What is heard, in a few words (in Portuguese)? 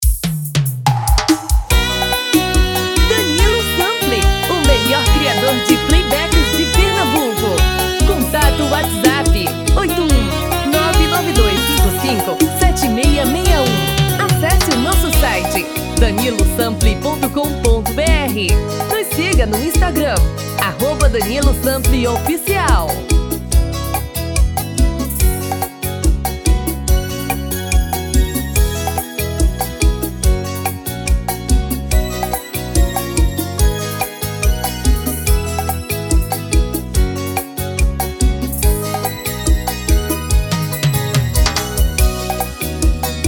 Na Seresta